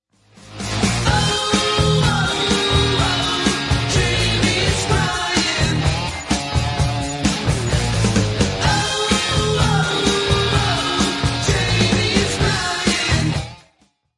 crying

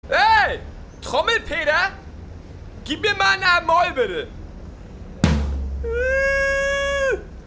trommepeter

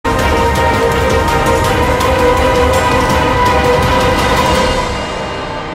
horror

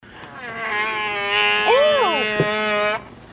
fart

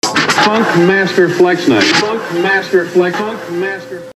funk

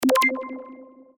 among us vote locking

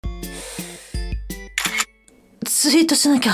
tweet

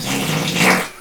gross fart button

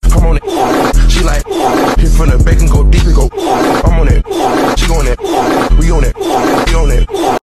fart noise

